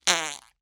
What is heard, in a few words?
human fart weak